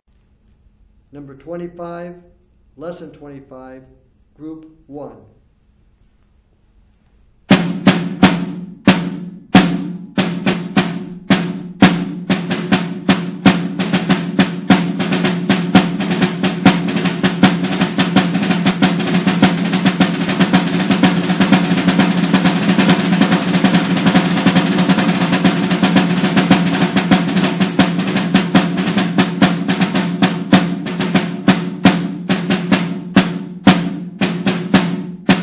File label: snare rudiment